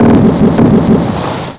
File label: blast; The Amazing Spectacular Ordinary Bottle